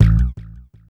bass, Grunge Hip Hop; USB Soundscan vol.34; Burning Grunge; AKAI

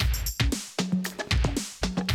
Classic Drum Loops

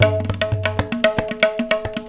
115 TABLA, Indian Traditions; USB Soundscan vol.45; AKAI

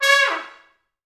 Pop Brass, C#4; Best Service; ProSamples vol.19; AKAI

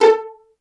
PIZZ CELLO A3 L; Greytsounds VOL-1; STEREO PIZZ2

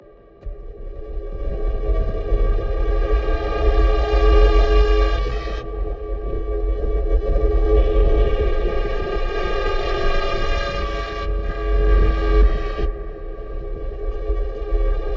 texture paranoid, drone pad texture

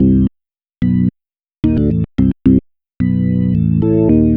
Bass Line; Eclectic Electronica

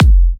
F, kicks; markus hakala kicks

